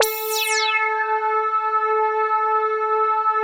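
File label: OBERHM OBXA, Oberheim OB-Xa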